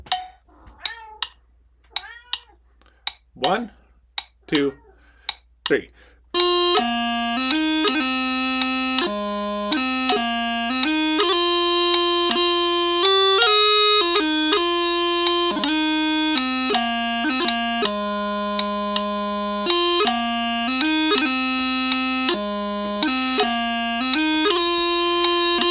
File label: 53bpm, Chanter; 9/8 Set